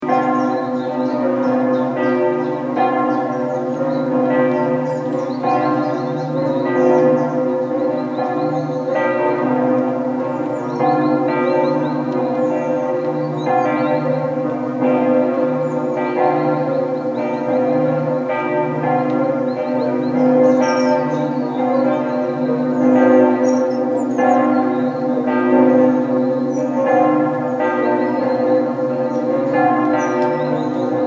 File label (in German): ev ref Kirche Loga alte Glocken